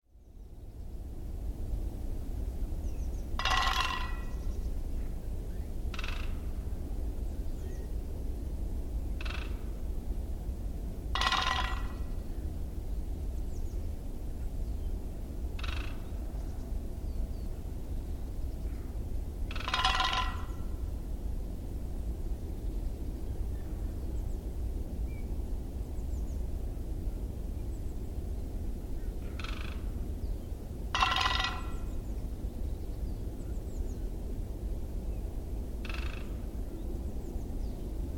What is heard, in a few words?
woodpeckers pecking telegraph poles